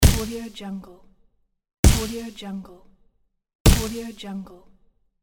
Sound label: feathery burst